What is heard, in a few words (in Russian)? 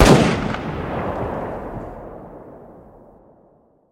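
пушечный одиночный залп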